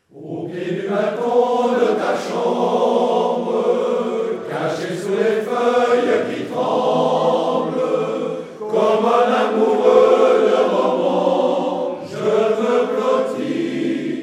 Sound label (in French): Serenade sans guitare